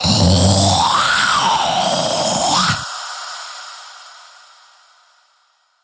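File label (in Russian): женский голос ужасов существо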